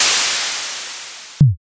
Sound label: airlock